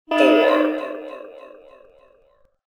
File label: Self Destruct; Combat; Cosmic Rage; The Experience-light; ships